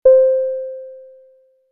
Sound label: rollover